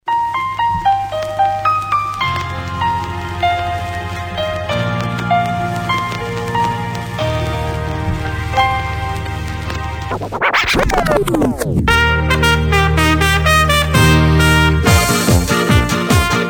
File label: ringtone; glasba iz oddaje kmetija